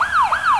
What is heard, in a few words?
siren